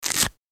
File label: short grapefruit tear; hollywoodedge